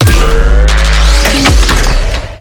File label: transform; gearmaster